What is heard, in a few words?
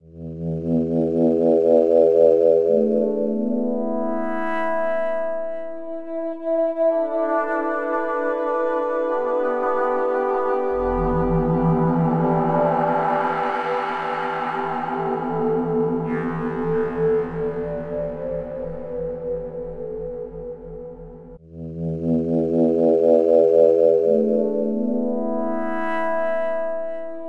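colony ambience loop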